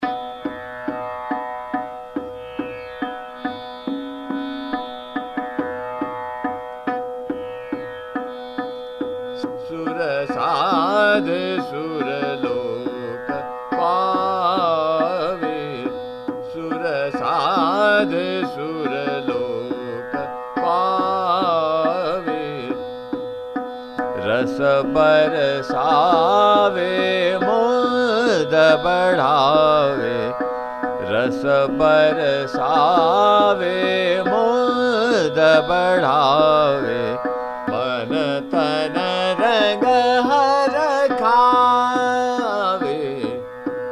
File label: Chota Khyal, Trital; Bhoopali; Sur Saadhe Sur Lok Paave